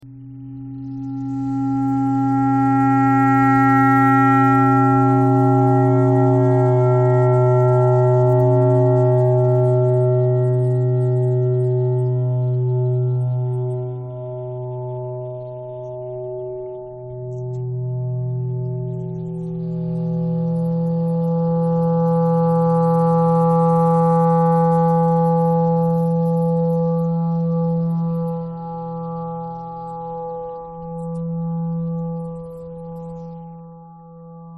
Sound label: clarinet thread noise